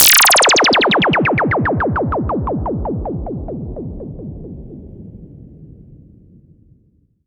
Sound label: Sweeps Down; Vengeance - Essential Clubsounds Vol.4; Effects